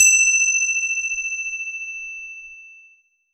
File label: Indian Bell Hit Loop, Ethnic Instruments; Sonic Foundry (Sony Creative Software); The Electronic Music Manuscript Collection